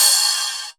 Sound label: Ride, Cymbals; Vengeance Samples